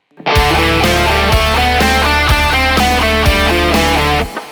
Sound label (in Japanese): ドリアンスケール